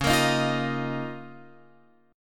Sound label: C sharp 6th Add 9th, Guitar, Open F; C#6add9; strummed; tuning=Open F; guitar chord